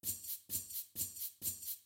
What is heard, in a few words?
music; trex; TRexRunner